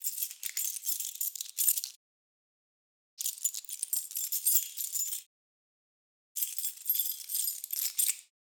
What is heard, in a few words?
keys